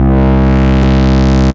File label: Analog Bass; Ableton; Retro Synths; Analog Bass Vol 1